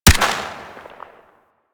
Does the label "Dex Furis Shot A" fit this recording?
Yes